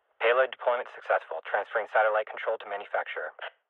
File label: satellite Deployed